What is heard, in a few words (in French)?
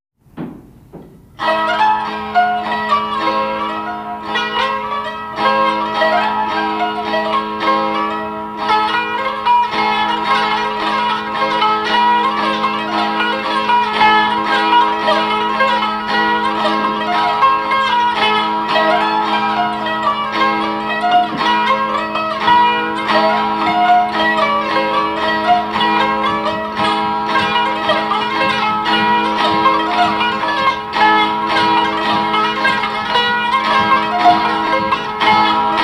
polka vosgienne; alsace vosges; FRANCIA - DALLA BRETAGNA ALLA PROVENZA, DALLA GUASCOGNA ALLA CORSICA, DAL 1913 AL 2000